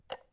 switch, UI Audio; Kenney's Sound Pack

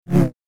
Double Bladed Saber Sound; FXHome

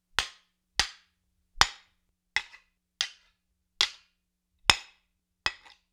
Axe Norse Sword Haft on Blade, Weapon on Weapon; StillNorthMedia

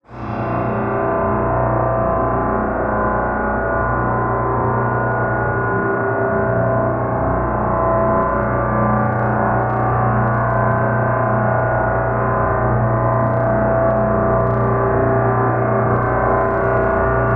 Gong, Drone, Stinger, Cymbals; Cymbals From Hell Vol 2

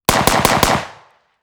HK MP7 4.6mm 1m left off axis clean Four shots; Heckler & Koch; MKH416

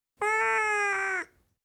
Siamese, Individual Meows, Cats; BBC Sound Effects Library

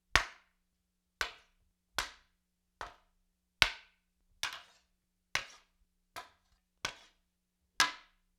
Spear Sabre Haft on Blade, Weapon on Weapon; StillNorthMedia

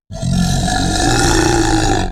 Screams & Shouts monster processed; SoundBits

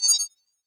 Accent, Interface, UI, Alert, Resonant, Waver

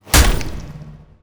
Weapon Hit, Future Melee Weapons; Audio Summoners

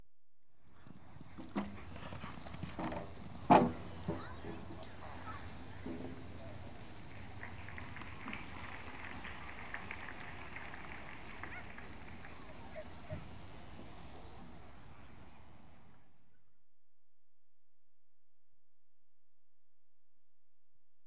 Show Jumping, Horse Jumps, Equestrian Events; BBC Sound Effects Library

